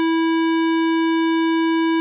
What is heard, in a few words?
wav Square; Various waves generated by the AY, ADLIB and SID; Z80